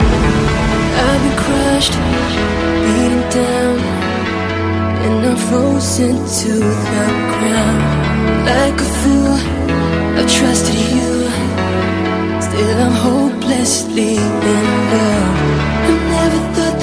Vocal Trance

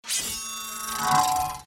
Saw; Transformers

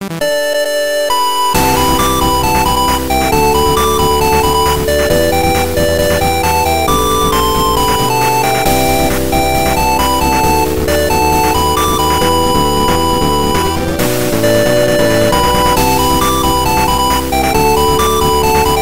8bit

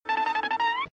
Tingle

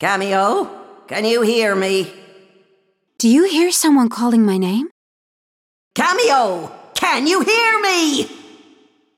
Vox; Kameo

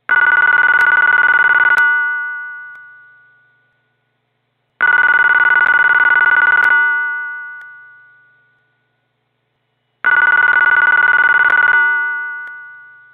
Desk Phone

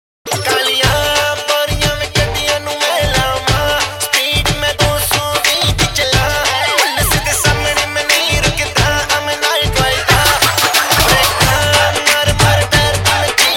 Mix Dhol

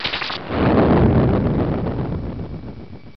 Thunder

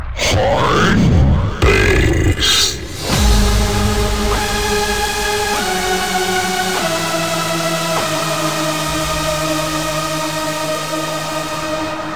Hardbass Sms

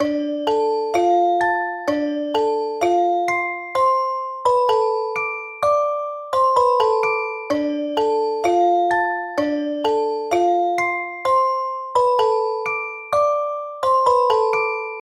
Lullaby Remix

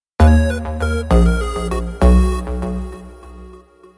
LG SMS Tone

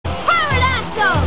Pterodactyl

